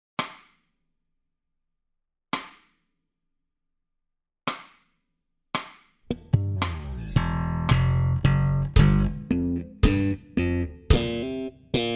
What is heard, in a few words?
Slap Theme Slow Base basso